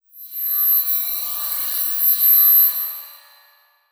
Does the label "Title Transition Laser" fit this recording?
Yes